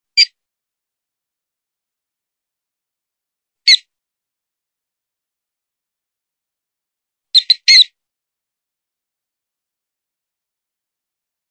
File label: Mouse Distress; Rodents